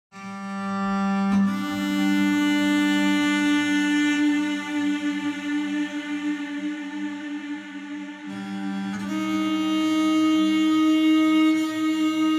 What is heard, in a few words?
Street Cello Wasteland 90